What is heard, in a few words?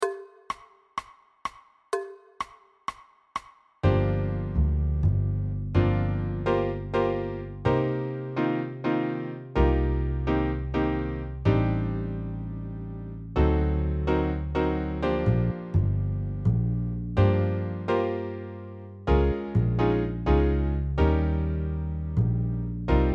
drums bossa